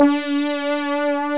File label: DIGIWAVE